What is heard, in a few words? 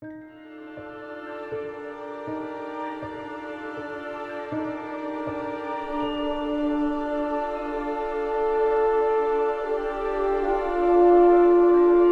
forest music